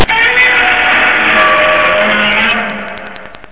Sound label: Roar; Godzilla